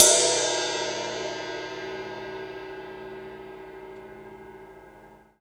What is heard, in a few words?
CYMBAL, RIDE; Sonic Foundry (Sony Creative Software); Drum Tools; Laidback